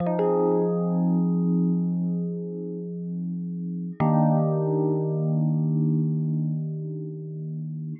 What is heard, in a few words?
120 Rhodes Chords F, Keys, Nu Jazz House; PowerFX